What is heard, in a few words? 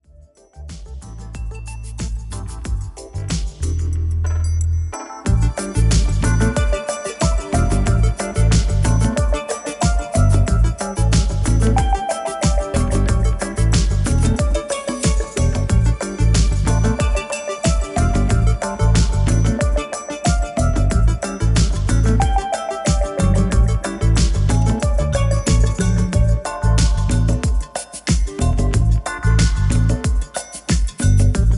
Instrumental